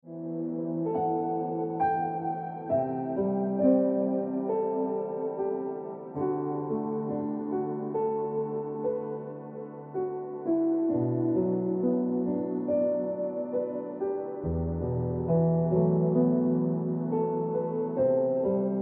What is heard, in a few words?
Piano Music